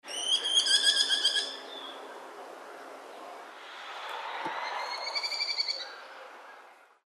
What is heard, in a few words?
Merlin Call; Raptors